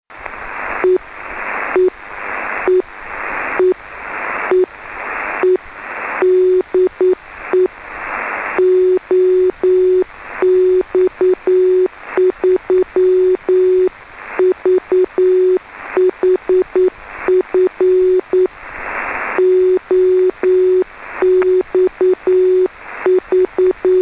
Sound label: 2m beacon 144,418 MHz; OX3VHF